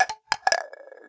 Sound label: shotgun shell, tink; Half Life 2; weapons